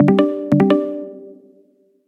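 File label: helium, zvuki telefona google pixel; Android-планшета Google Pixel C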